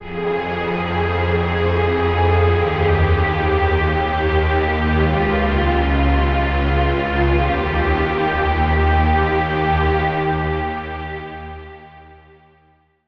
Pad, Warp Drive; Routed Sound; Vital Expansion - Reality Maker Vol.1

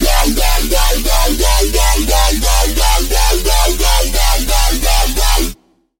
wobble; Twisted Mind; Routed Sound; Serum 2 Expansion - Chaos Bringer Vol.1

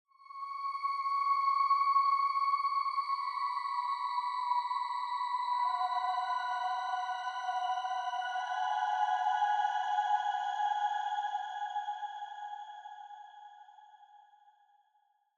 Pad Meteor Shower; Routed Sound; Spire Expansion; Star Scavenger Vol.1

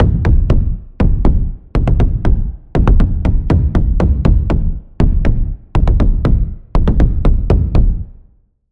Impact - Taiko, Sylenth1; Routed Sound; Sylenth1 Expansion - Quantum Enforcer Vol.1

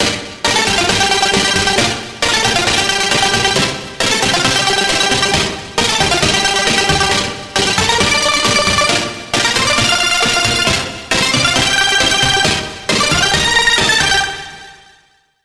Pluck, Vital; Pixel City; Routed Sound; Vital Expansion - Hyper Gamer Vol.1

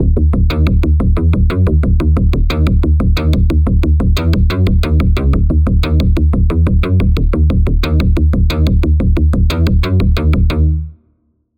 Pulse

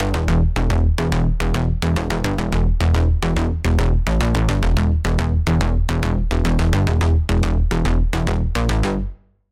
Bass, Sylenth1; Shrouded Ebon; Routed Sound; Sylenth1 Expansion; Doom Carpenter Vol.1

